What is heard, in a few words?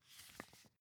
magazine flip page